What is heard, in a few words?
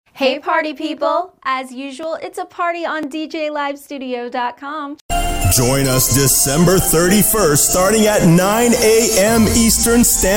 dj live studio party event